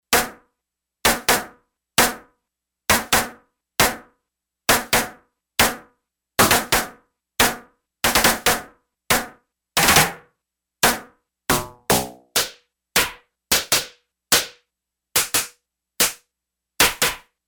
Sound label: Snare, Yamaha DX11; Factory Preset Voices